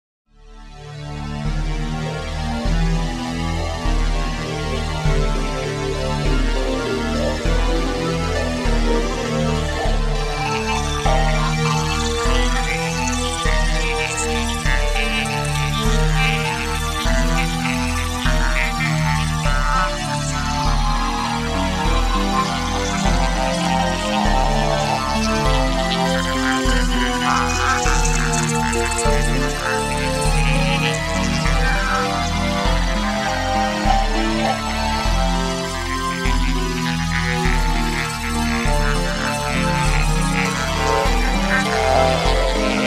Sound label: east romantic electro Interlude; Nine Music Drink 3